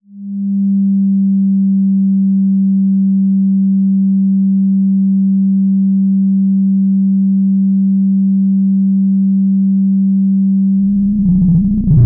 Filter nackt

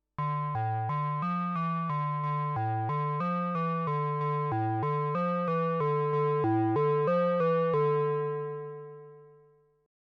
MOOG MATRIARCH Ringmodulation Basismix

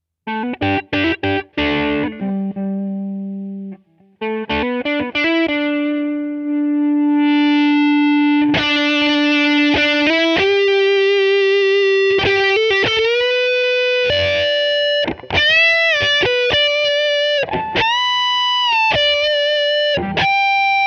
Solo Hals HB Volume auf 3 dann auf 10

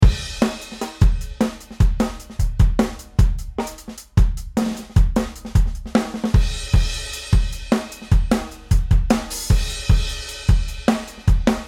Schlagzeug mit VC 2A Compress 2-4 dB Gain Reduction

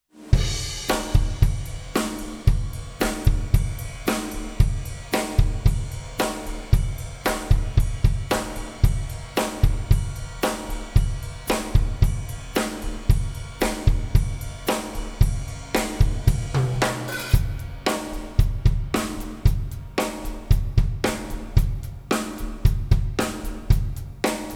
Drums Drawmer relativ punchy comp

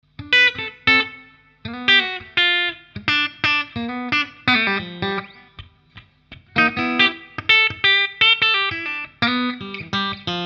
Stegpickup clean

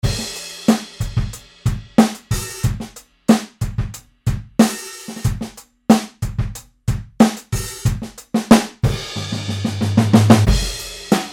Standard Kits

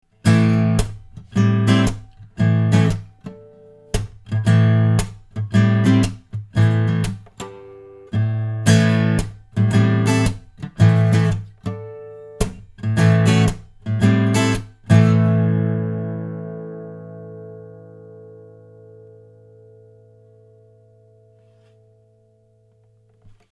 Akku mono

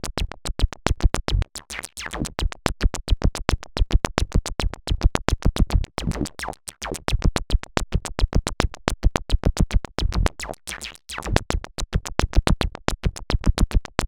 Eurorack CV Steuerung Coherence Input 2 alleine Divider CV

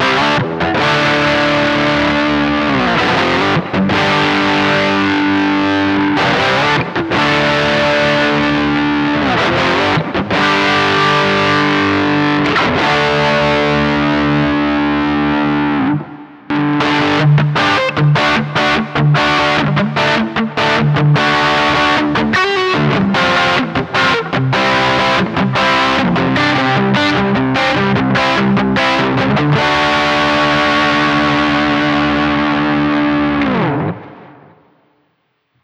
Floorboards im Vergleich Highgain w Pedal OD Kemper

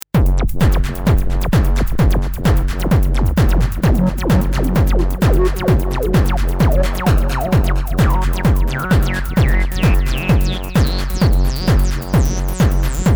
mx 303 attack

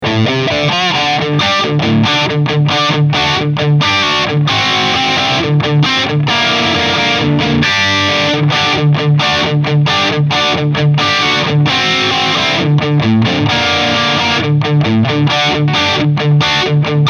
Peavey Invective MH Crunchsound EQ 12 Uhr

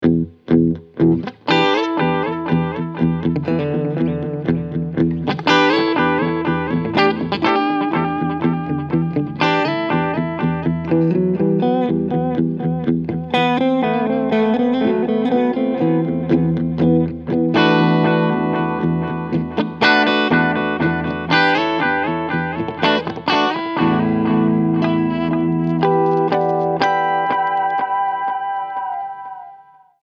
Delay pur

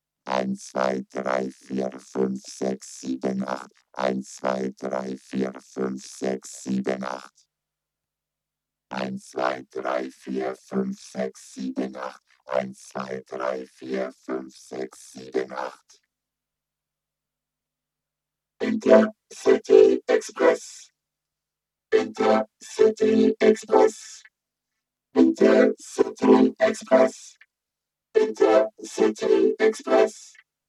VP330 Vocoder mit und ohne Ensemble

